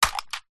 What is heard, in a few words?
impacts plastic vhs cassette tape